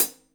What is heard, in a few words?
crisp high hat c sharp key; hits one shots; hat samples